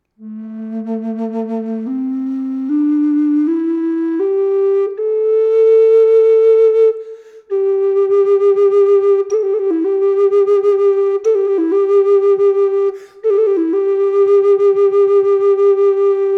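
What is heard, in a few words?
reverb, flute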